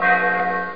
Top Lane; Crystal Caliburn™ DEMO

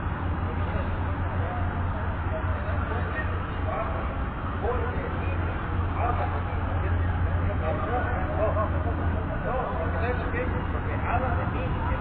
city; Cross Racing Championship

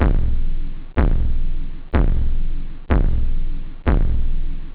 acid, drums techno; OctaMED SoundStudio V1

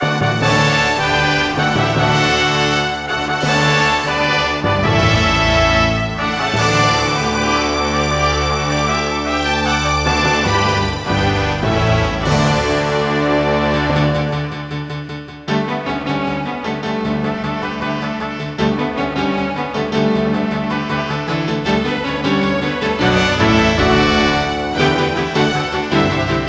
victory; World of Sound

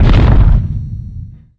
walk

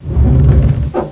DR OPEN; Magic - An Insider's View

